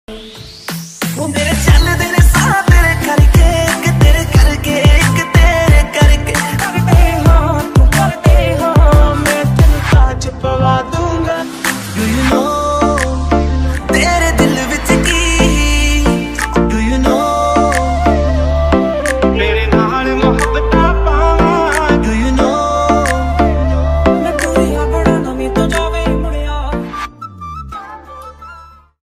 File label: Punjabi mix Mashup, panjabi ringtone